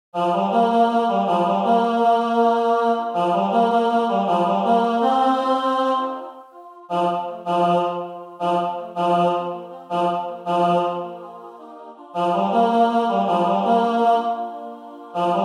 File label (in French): basse